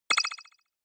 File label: Onoma Surprise High Short